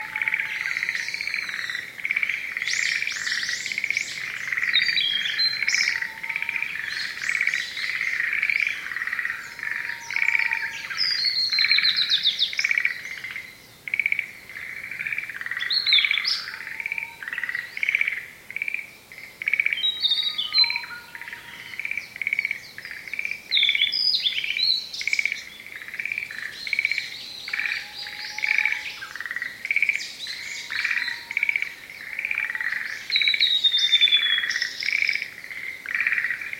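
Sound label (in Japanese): hoojiro, meadow bunting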